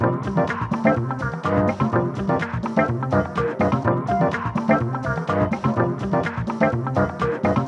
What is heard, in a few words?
music loop main chord; netent